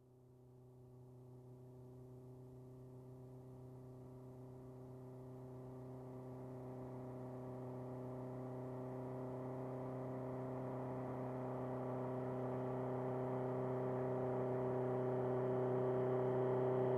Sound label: Motorcycles